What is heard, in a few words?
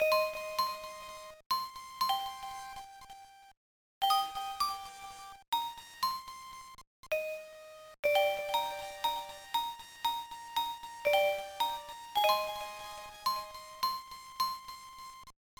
Whistle; Starshade; Tomato Adventure; GBA